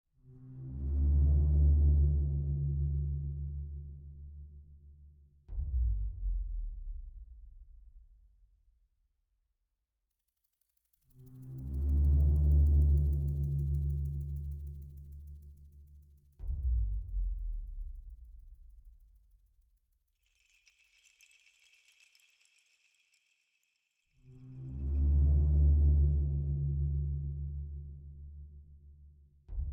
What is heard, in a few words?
Forest